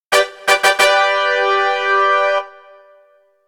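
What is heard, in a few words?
electronic announcement panpakapaan; anemoi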